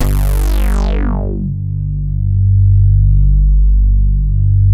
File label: reso pad; Sound Gallery - Charged